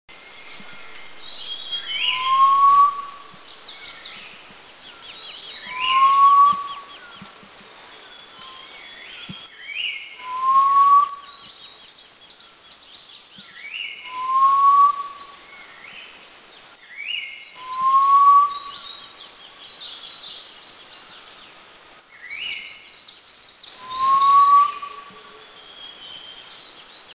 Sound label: Hill Partridge, Eaglenest, India